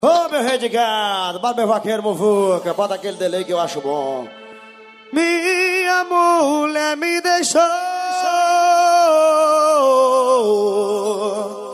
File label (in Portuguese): forró; Parque 3 Corações